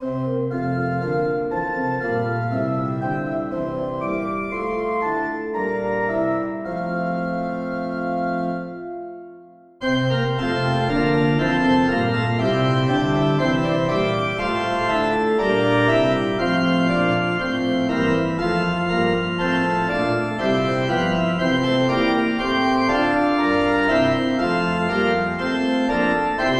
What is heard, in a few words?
Organ, hymns without words; A man there lived in Galilee; Tyrol; 3 verses